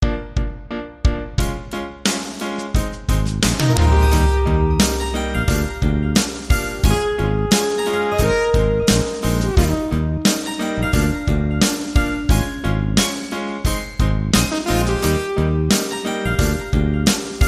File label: Piano Hop